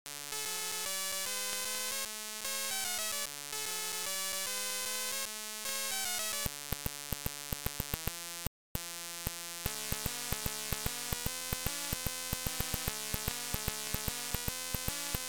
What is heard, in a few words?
Title AY Beeper; The Arc of Yesod